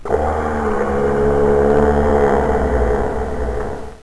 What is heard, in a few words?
groan; Mr. Wonder's Greatest Toyshop on Earth; OmniMedia